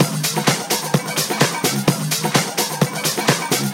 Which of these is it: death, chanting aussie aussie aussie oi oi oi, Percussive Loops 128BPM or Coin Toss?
Percussive Loops 128BPM